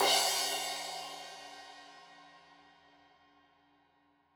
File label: Crash 15in L edge, Cymbals Stereo